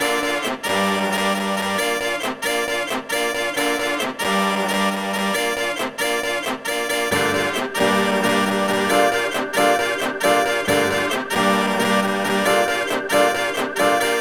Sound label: D min 135 bpm